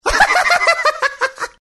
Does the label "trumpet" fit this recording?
No